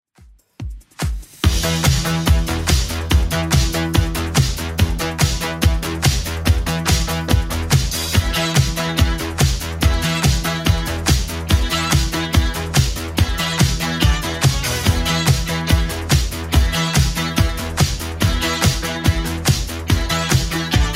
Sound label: 80s Club 143 bpm